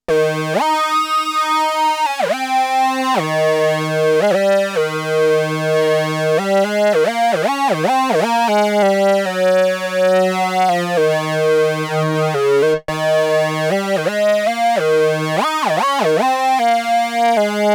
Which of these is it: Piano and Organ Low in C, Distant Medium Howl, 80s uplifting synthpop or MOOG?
MOOG